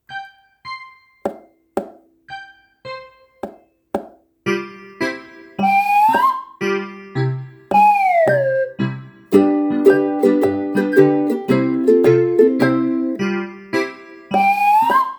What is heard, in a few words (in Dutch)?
Pieplied Karaoke